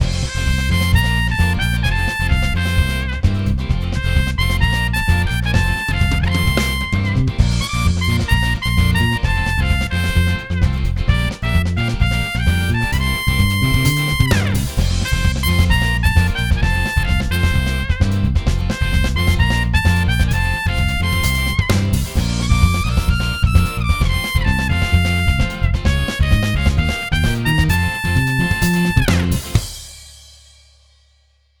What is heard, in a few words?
Trumpet; 밝고 맑은